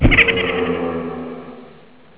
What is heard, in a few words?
shadow strike birth; Warcraft 3